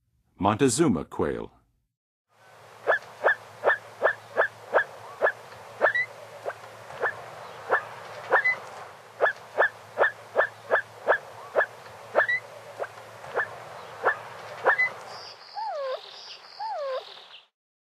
Montezuma Quail, Birds of North America; Western USA; Western USA Songbirds

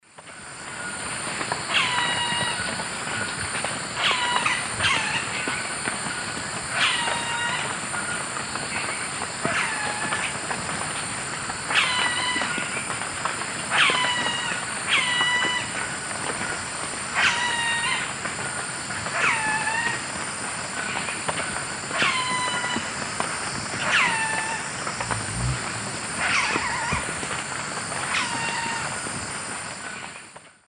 Celeus elegans, calls; S. America; Eastern Lowlands

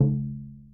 ESSENTIAL PERCUSSION

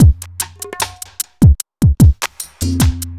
evil beats 75

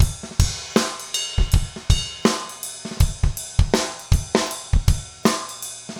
trip hop

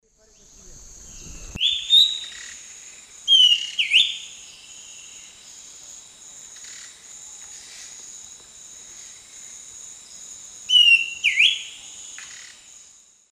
Schiffornis major, song; S. America; Eastern Lowlands

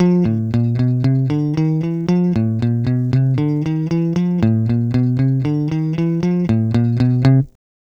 Bass, RnB

